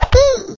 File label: Poke with football